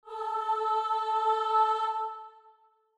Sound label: starting note, voice; pesante